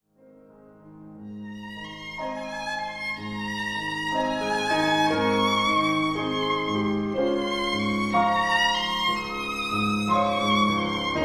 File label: romance violin